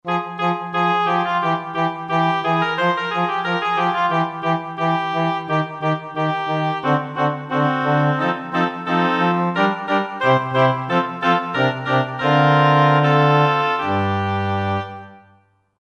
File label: Chamber Winds, Roland JV-2080